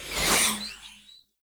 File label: Rain Rune Projectile Whizz; Powers; Runes